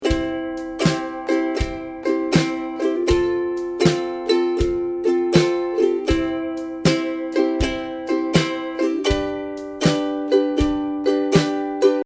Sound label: strum, ukulele